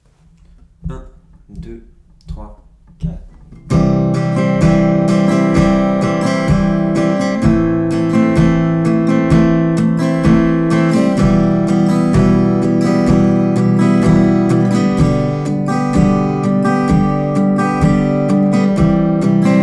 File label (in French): croche 2 doubles, theorie rythmiques doubles croches